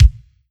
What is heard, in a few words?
Korg N1R Bass Drum